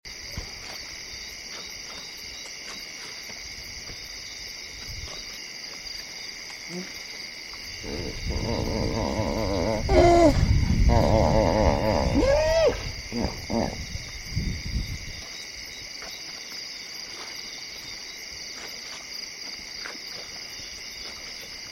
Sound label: Night Hippo Grunt; Big Animals